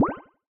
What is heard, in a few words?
blubb, battle; CrossCode